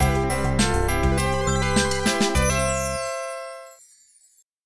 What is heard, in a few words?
Ingame Level Passed; Mission in Snowdriftland